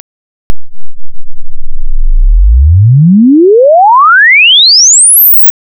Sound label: Log Chirp, chirp signal on a log-frequency scale